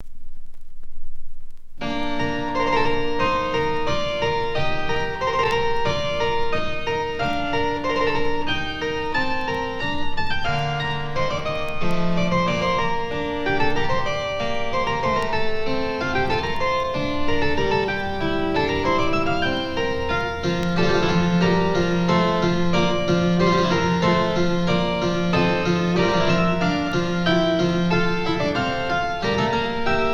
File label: Partita, Prelude; No.1 In B Flat Major